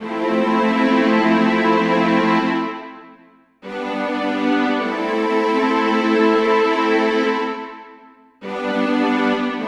String; Sonic Foundry (Sony Creative Software); Infinite Soul VOL-2

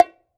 H-BONGO OP; Cuba

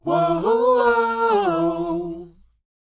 WHOA; ILIO; Vocal Planet

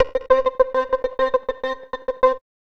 D&B Keys Loop; neuro

